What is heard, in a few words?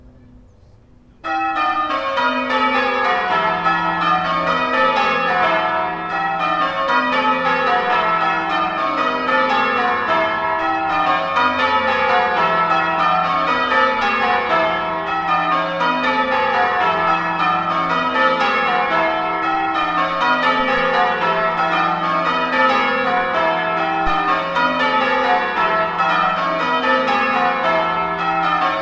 bells; hitchin